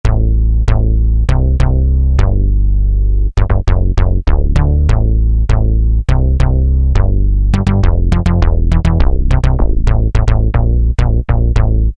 Unit Bass, Alesis Z2 EuroDance

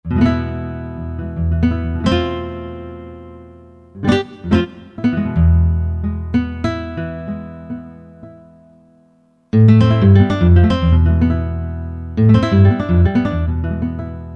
Latin Nylon; World; Roland SR-JV80-18 World Collection Latin

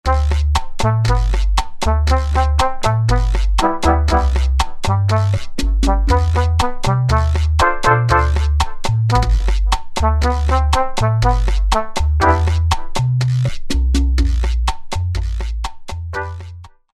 Cha-Cha, Alesis Z7 Latin

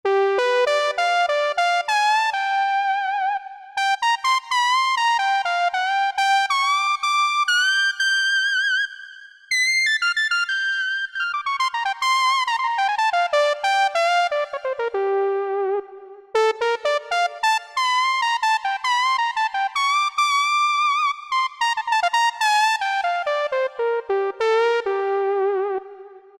SL Saw, Yamaha TG500

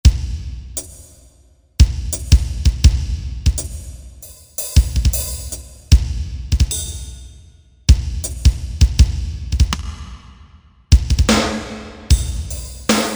new drums

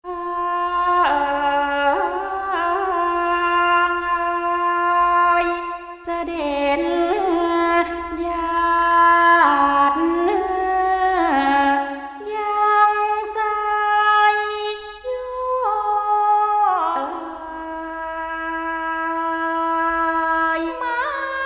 SINGING - Thai Opera Diva; Spectrasonics Heart Of Asia

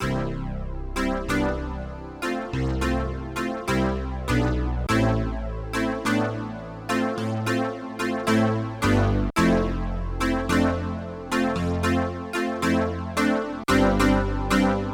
Spacey Keys, Yamaha TX16W; Blissperformance

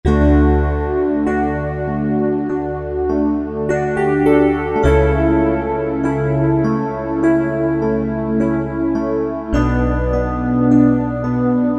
Digipno & Breath

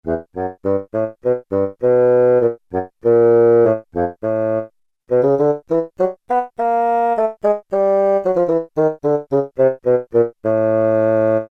Woodwind Bassoon 1; Brass And Woodwinds; Roland L-CDX-03

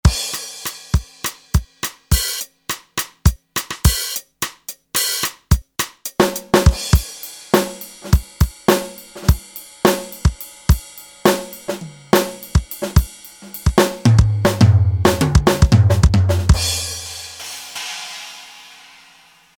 Natural Kit; Roland L-CDX-01 Rhythm Section Instruments

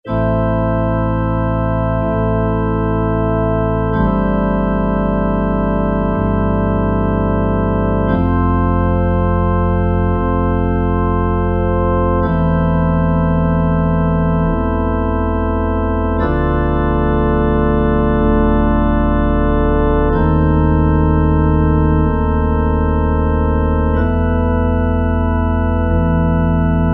Pipes; Kurzweil K2000 Orchestral ROM

